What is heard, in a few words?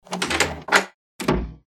door open close